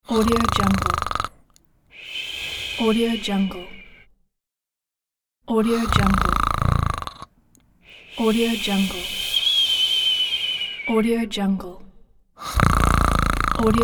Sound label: snoring